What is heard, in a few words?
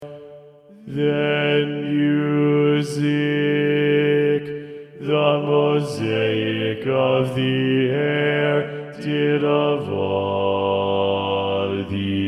B2 Predominant, TTBB